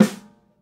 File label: fluffy snare sound g sharp key